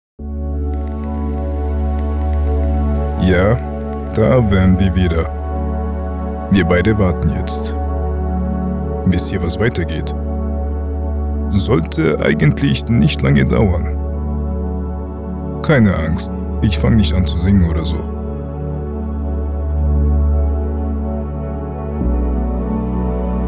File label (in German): Warteschleife; TA-Warteschleife